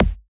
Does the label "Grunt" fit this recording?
No